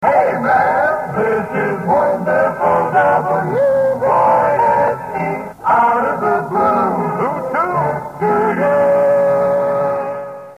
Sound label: jingle; WYSE